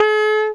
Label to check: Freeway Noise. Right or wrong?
Wrong